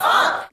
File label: "oh", crowd; ooh ahh